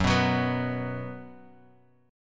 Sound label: B 5th Gb, chord